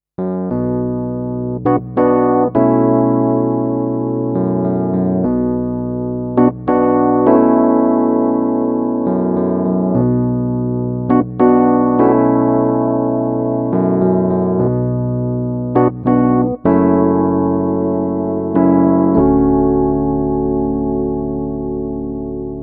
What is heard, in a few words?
e piano